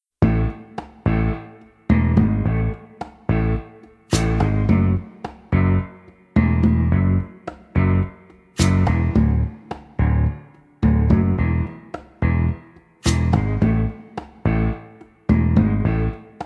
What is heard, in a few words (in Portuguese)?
Aniversário Colega de Trabalho Homem Masculino